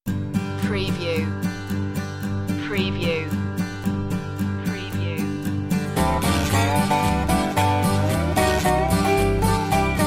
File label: farmyard blues